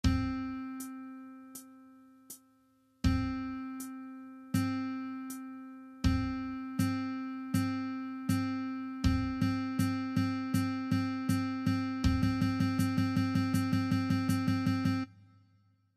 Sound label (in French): Solfege